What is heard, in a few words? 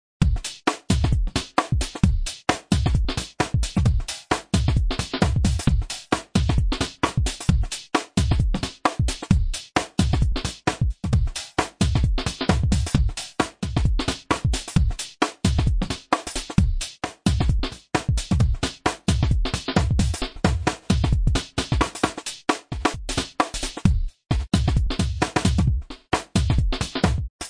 4bars drums loop, blues; remixable collections